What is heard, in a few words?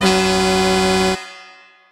boat honk